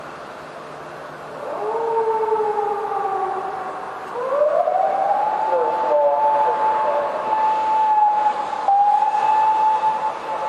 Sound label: toilet siren